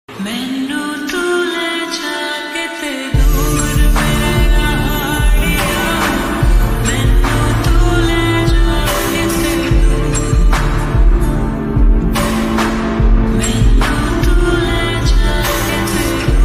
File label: slow and reverb ringtone lofi ringtone romantic mashup ringtone